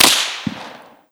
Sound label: snap